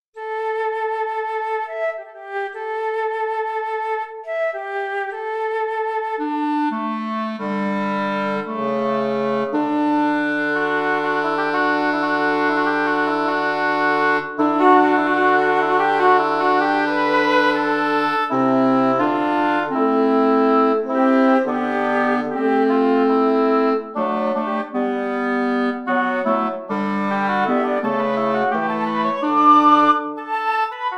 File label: centaur ji winds; centaur tuning